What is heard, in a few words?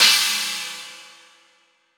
China Cymbal; Roland BK-5